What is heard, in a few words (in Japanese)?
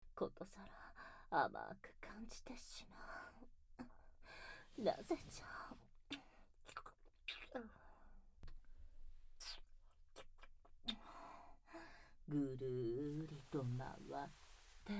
全身リップ&添い寝; 魔法の肯定力…千年魔王のナユタ様を助けたら、とびきりの愛されセックスで守られ続ける物語♪; 大切なそなたが眠るまで、吾が見守っておるよ